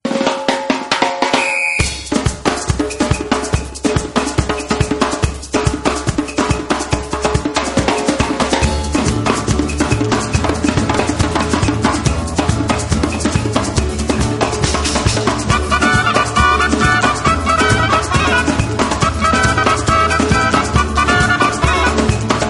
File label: Djembe